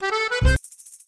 laugh; AvatarEmojiSticker Beyond

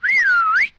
Whistle, notifications; Huawei P20

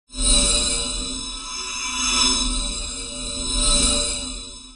3D Effect; Nokia N92

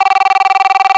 Basic Ring; Samsung SGH-C417

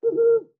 flashlight; Xiaomi Redmi-8A

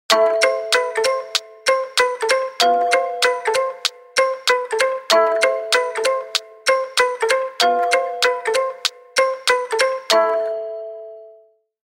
four stringer, alarms; HTC One-X

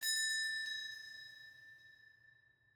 Wind Chime; Samsung; Galaxy A56; Calm

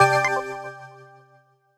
Unlock, phone ui

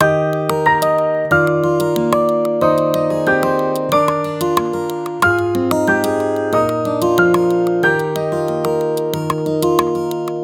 Sunset Avenue, ringtones; Asus MeMo Pad 7 K01A

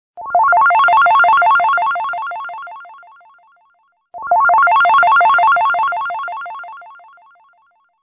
Ring; Simple

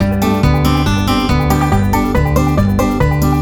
Country, ringtones; Cousin Jones; Google; NewWave Labs

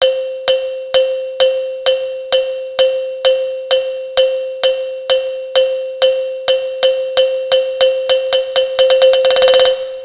Timer; Motorola PEBL VU20; Camera

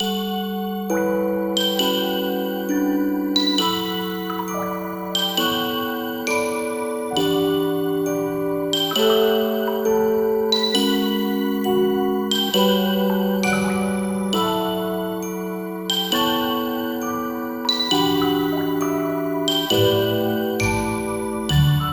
Weaving Voices, ringtones; Sony Xperia A SO 04E

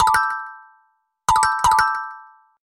Poke, alarms; LG Qua tab PZ LGT32

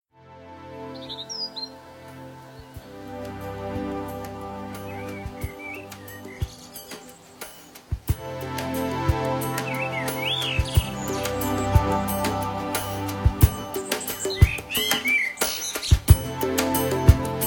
Early Chill, Alert tones; Nokia; 515

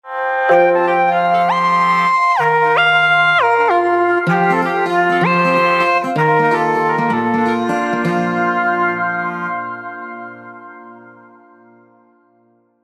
Woody melody

